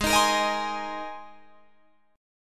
G sharp Major Add 9th, Guitar Drop D

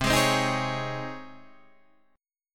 Db Major 7th Suspended 2nd C

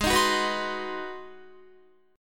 Ab 7th Sharp 9th down Guitar; Ab7#9 strummed; JGuitar

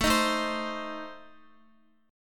A Diminished, down Guitar; strummed

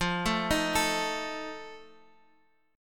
D sharp Suspended 2nd Flat 5th F

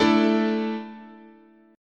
A Suspended 4th, chord

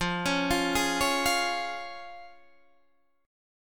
F Minor Sharp 5th, F, Guitar, Half Step Down

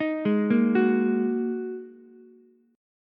Bb 7th Suspended 4th Sharp 5th down Ukulele; Bb7sus4#5; arpeggiated; JGuitar

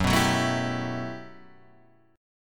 G Major 13th

